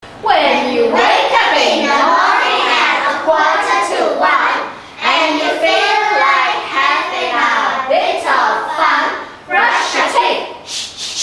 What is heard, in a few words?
Toothpaste Chant